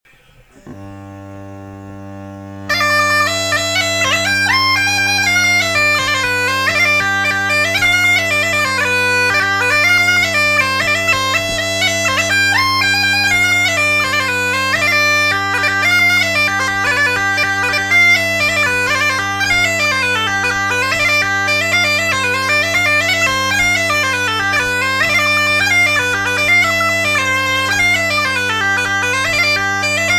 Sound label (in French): Polka, cornemuse